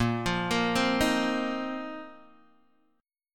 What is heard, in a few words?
A sharp Suspended 2nd Suspended 4th down Guitar Open D